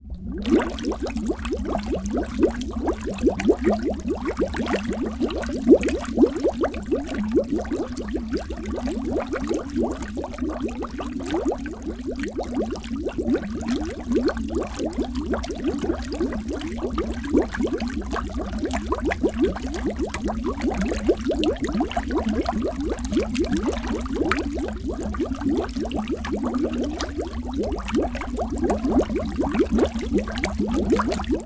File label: water bubbles two foot deep